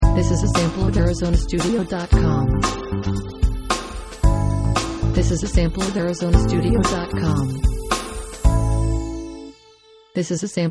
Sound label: night drive